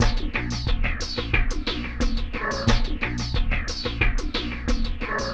REZ REGGAE 90; AMG; Global Trance Mission VOL-2; PROCESEDLPS

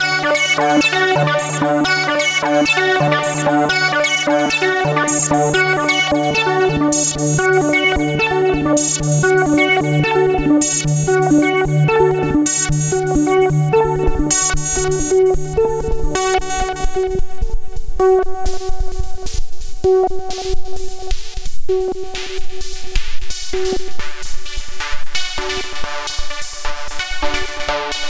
Synth Sequence; Eclectic Electronica

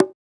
Percussion, House

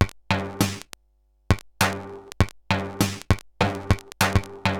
Bent RX17 (100BPM), cyborg beats; musicradar

